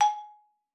G#4, xylophone; Xsample VOL-4

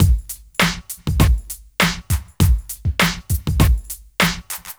Club Tip, R&B Drums

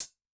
Close, HiHat; Yamaha DTXpress-II

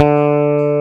bass 2, D3

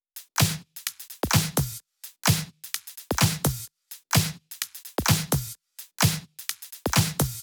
Loops 128BPM, Drumloops

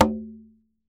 G, congas tuned; Q Up Arts; Denny Jaeger Private Collection

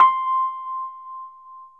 Rhodes 73 Slow, C

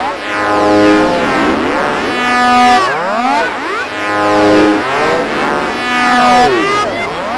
NRG SFX, Trance

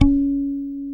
SADWSKY MUTE, Bass; Sampleheads; Will Lee Bass Library; SADWSKY5MUTE

